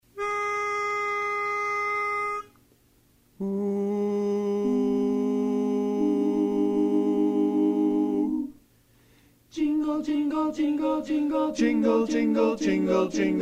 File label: Bass